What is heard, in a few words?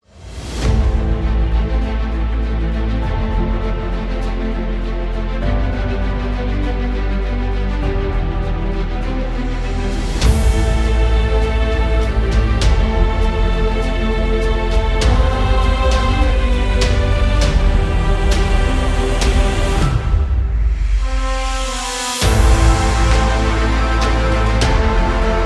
Cinematic Trailer Upbeat